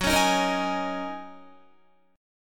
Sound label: F sharp 6th Add 9th F sharp Guitar; F#6add9; 13,16,15,15,15,16; strummed